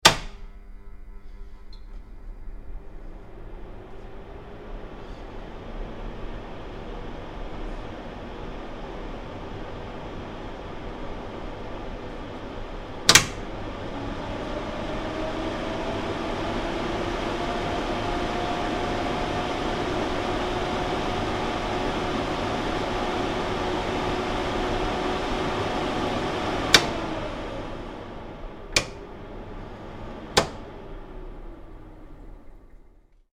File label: Extractor Fan, Fan at various speeds - start and off; Electronics